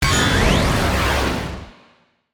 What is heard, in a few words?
Attack Dark Default